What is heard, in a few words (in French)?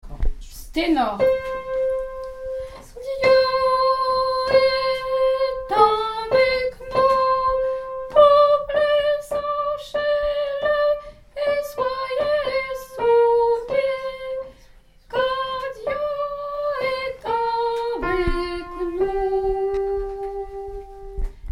Dieu est avec nous Tenor